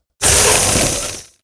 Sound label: Ice Blast